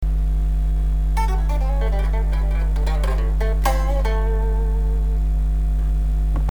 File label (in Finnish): biisi riffi